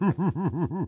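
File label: laugh evil, French; Pyro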